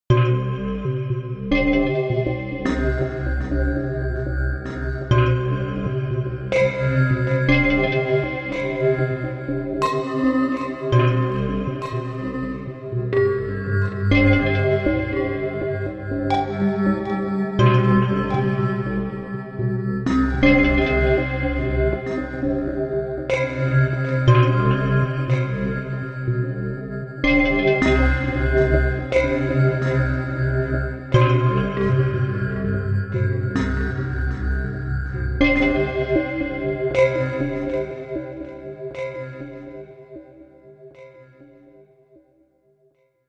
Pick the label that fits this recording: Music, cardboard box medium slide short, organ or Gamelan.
Gamelan